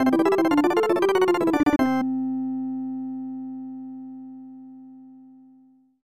Unused Jingle; Super Double Yakuman; SNES

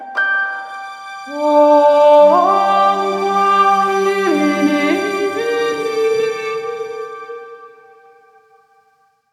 Sting Night to Day; Armello The Bandit Clan

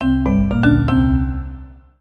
Puzzle Success Jingle; windscape switch windows xbox one gamerip 2016

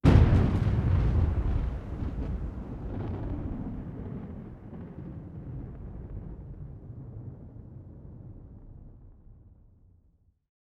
Thunder

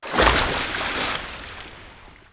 Waterski; Levels Hub Bayou 02 Twitch Waterski

